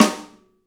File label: Pop Snare; Snares